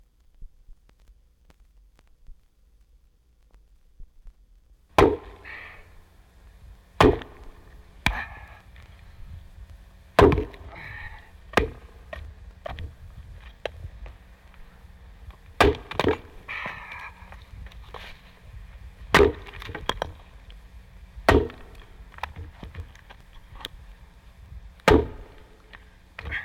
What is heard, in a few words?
Chopping Wood; Jac Holzman; Authentic Sound Effects Volume 2